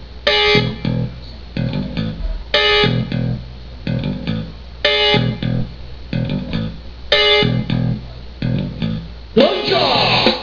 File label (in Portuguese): fundo musical